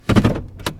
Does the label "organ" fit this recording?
No